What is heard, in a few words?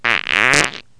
tight fart